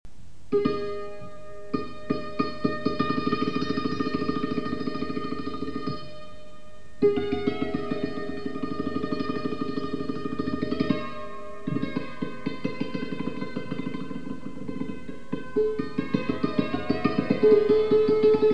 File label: santour